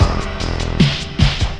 hiphouse w bass; Sonic Foundry (Sony Creative Software); Ugly Remnants VOL-2

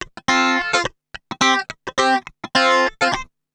FUNK, FUNKY GTR

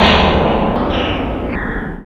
Kaos FX, Ambient Grooves

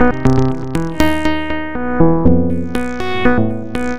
Atmo Fear, Underground UK House & Electro, Synths; Sonic Foundry (Sony Creative Software)

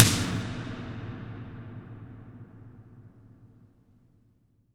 toms, cinematic impact, drum

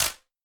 Wider Clap

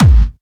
Clubby Kicks, Bassdrums; Vengeance; Essential Clubsounds Vol.4

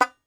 Tenor Banjo, Plucked Strings

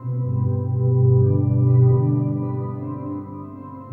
Steel Loop, Pastoral; Sonic Foundry (Sony Creative Software) - Friendly Fire

